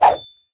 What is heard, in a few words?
sword attack; matmos interactions